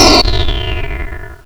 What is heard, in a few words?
teleport